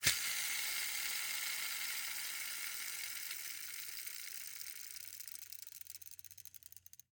Rollerskates, old fashioned, all metal, wooden wheels, swipe, spinning, slow to stop; Eiravaein Works; Vaeyan IV